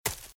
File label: Footsteps Leaves Sprint Right; RadwinIsland